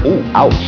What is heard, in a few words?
ooh ouch